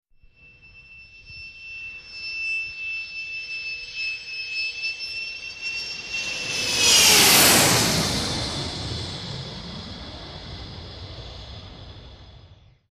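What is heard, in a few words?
Large Jet Pass Low Land, jets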